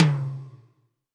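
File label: Tom Hi Clear Wet, Alesis D4 drum machine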